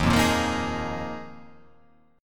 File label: D sharp Suspended 2nd Suspended 4th D sharp